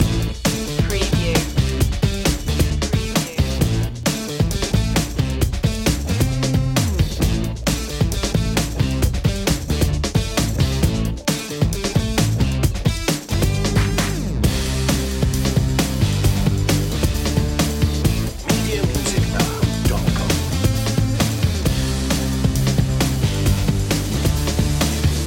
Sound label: fuzzy gritty skank loop